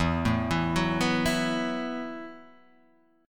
E Suspended 2nd Suspended 4th E, Guitar, Open D; down

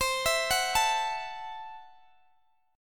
C 6th Flat 5th C down Guitar DADGAD

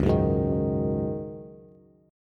Db Suspended 2nd Suspended 4th, Bass; 9,9,6,8; strummed

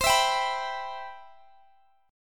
C Major 7th, C, down, Guitar, DADGAD

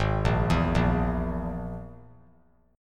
G sharp Suspended 2nd Sharp 5th, chord; G#sus2#5; arpeggiated; JGuitar

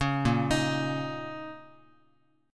Bb Minor Double Flat 5th Bb, Guitar; Bbmbb5; arpeggiated; 4,6,6,x,x,x; JGuitar

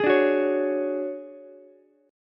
D Major 7th Flat 5th D down Ukulele; strummed; JGuitar

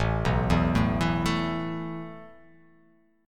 A Suspended 2nd, guitar chord; Asus2 arpeggiated

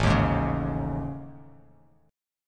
A Major 7th Flat 5th, down; AM7b5 strummed; JGuitar

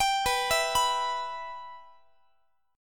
B Suspended 4th Sharp 5th B Guitar; Bsus4#5; arpeggiated

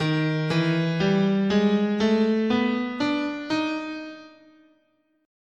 Eb Persian, scale

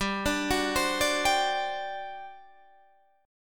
G Major 7th Suspended 4th, G, down; GM7sus4; arpeggiated

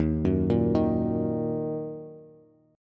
D sharp Minor D sharp, Bass; D#m arpeggiated; 11,9,8,8

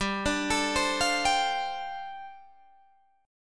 G 7th Suspended 2nd Suspended 4th, Guitar DADGAD

